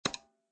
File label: music lottery